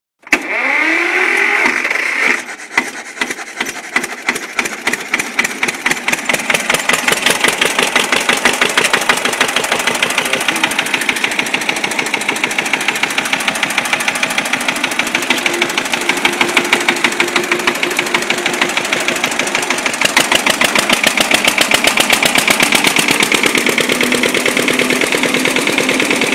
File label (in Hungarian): traktor motor indítás, tractor engine startup ringtone; csengőhang